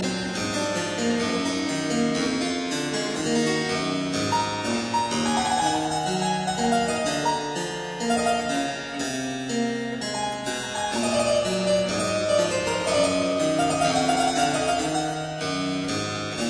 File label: A New Ground in E minor; Purcell; Z T682; Arthur Haas